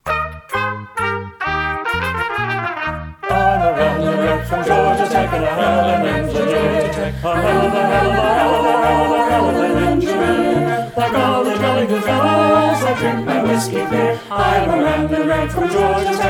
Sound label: virtual band and choir; ramblin wreck